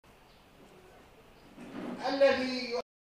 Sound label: loop